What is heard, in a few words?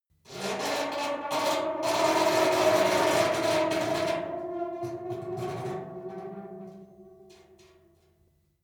metal squeal saturated rough; industrie-wirtschaft